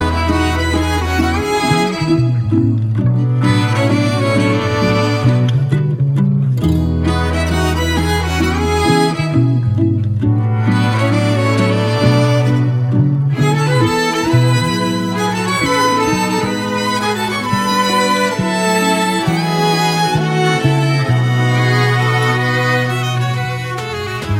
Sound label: love bgm; telugu